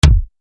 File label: KICK, Yamaha RY-30